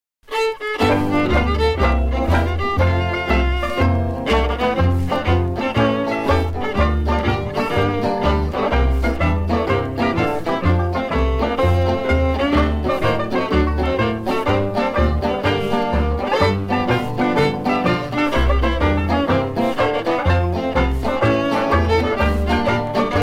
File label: instrumental